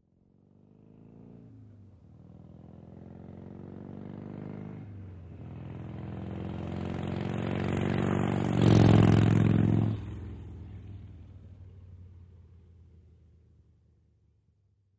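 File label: Motorcycle Harley By Medium; harley-davidson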